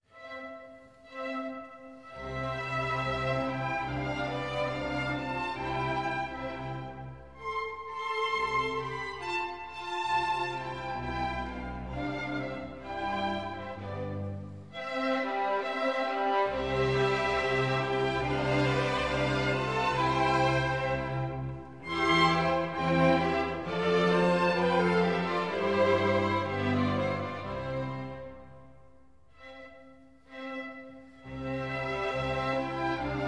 Serenade No. 13 in G major, Romance; Serenade No. 13 in G major, K. 525, Eine kleine Nachtmusik; II. Romance

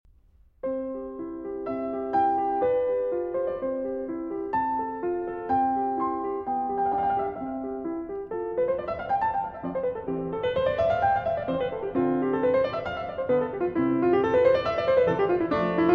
Piano Sonata in C Major, Allegro; No. 16; K. 545 Sonata facile

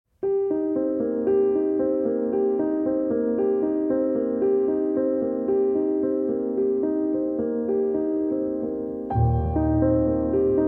Piano Variation; Symphony of a Thousand; After Symphony No. 8, Arr. For Piano

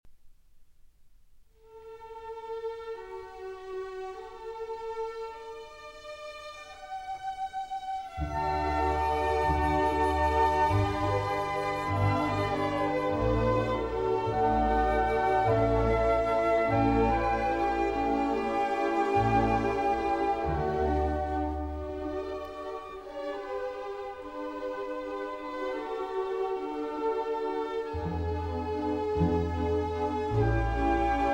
Violin Concerto No. 3 in G Major; K. 216; Mozart

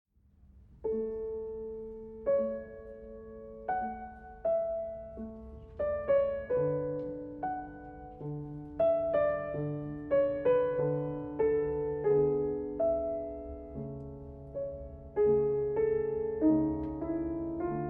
Keyboard Sonata in A Major; Anastasia Calmus; Kk. 208

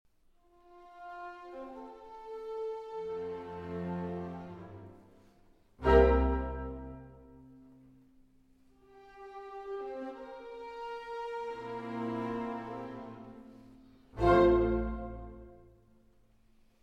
Symphony No. 41 in C Major, Andante cantabile; Symphony No. 41 in C Major, K. 551, Jupiter; II. Andante cantabile

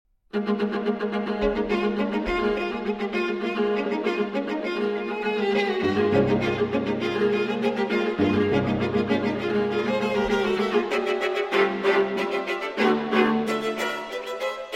Pieces For String Quartet, Alla Czeca; Schulhoff 5 Pieces For String Quartet - No. 3, Alla Czeca

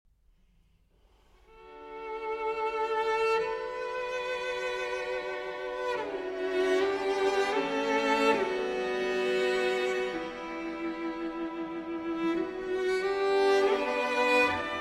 Cello & O; Arr. For Cello & Orchestra

